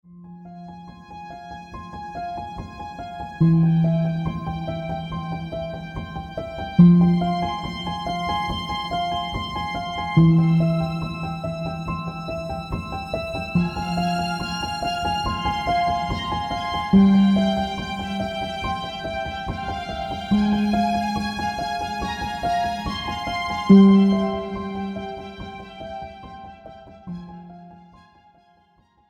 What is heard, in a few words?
Orch Building